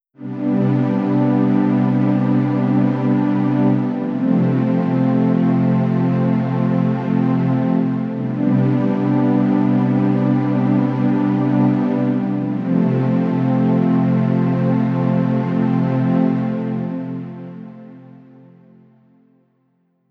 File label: pad no fx